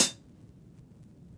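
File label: Vinyl Closed Hat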